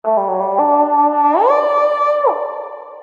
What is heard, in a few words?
Lost Bassoon; V-Synth